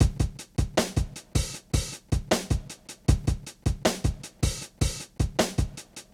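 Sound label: Drums 78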